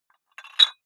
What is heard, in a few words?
dumbbell put on very small weight hit; sport freizeit; fitnessstudio